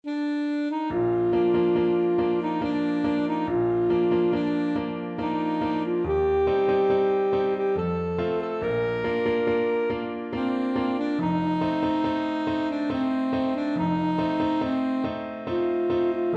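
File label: greek nat alto saxophone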